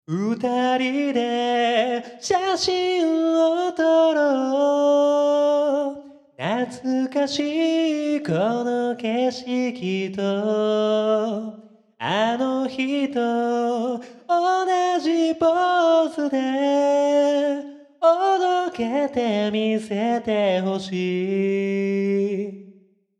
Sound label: vocal